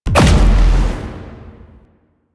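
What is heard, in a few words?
Pod Shotgun Projectile Explosion; Weapons; DE; Warframe; Tenno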